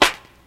snare sound b key, acoustic snare sample tuned to the B note. Loudest frequency: 2388Hz; drum single hits one shots; snare drum samples